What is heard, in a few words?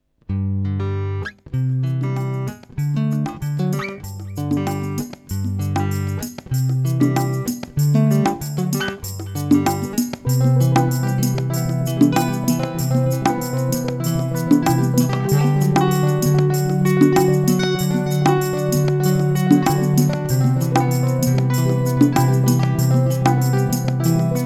midi menuet one shot